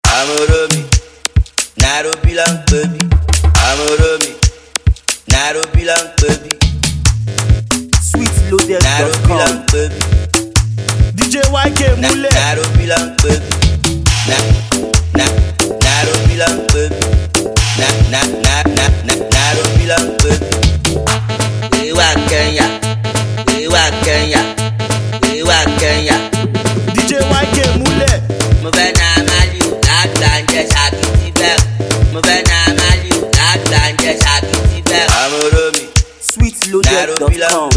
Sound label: Kenya Loud Free Beat